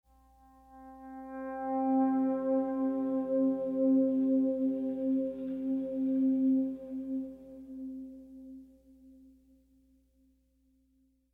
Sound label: Pleasant Guitar; ML Pleasant Guitar Mood 7